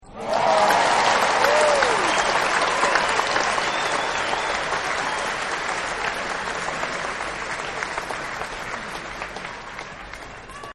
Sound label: Medium Cheer, Crowds; Backgrounds